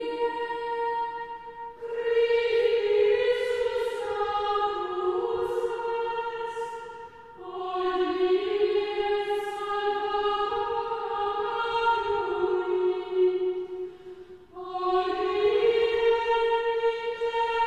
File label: Chant Antiphon; Traditional; Chester Processional